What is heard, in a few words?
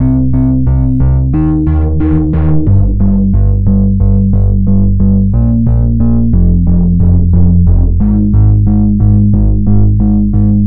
bass